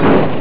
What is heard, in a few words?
CRASH; GREAT ACTION; SKIDMARKS 2